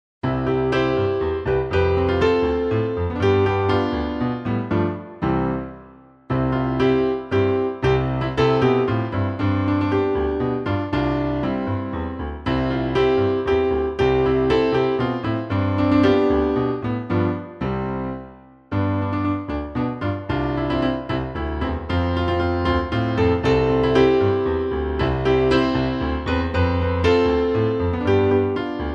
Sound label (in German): hymn C-dur; piesni patriotycznej